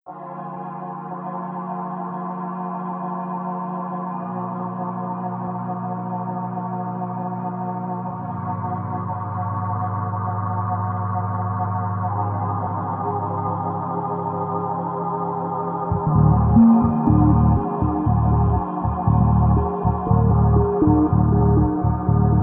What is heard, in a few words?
guitar chill 432 hz